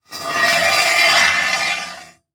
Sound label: Impact Street Sign Drag On Cement